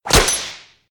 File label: Trap; Doodle Jump; 3DS, Android, Arcade, DS, iOS, Mobile, Xbox 360